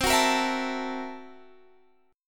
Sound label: Fsharp 7th Sharp 9th Flat 5th, guitar chord